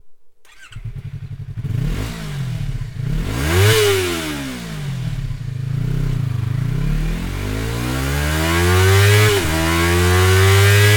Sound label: slip on wo insert